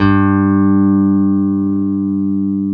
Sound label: BS2 G2, PICK BASS